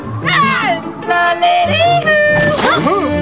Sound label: yodel